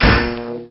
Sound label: pain; droid